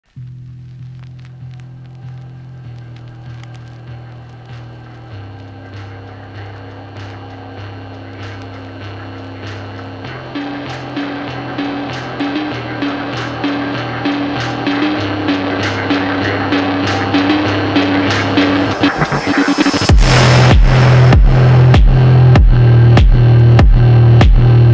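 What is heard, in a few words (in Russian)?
фонк slowed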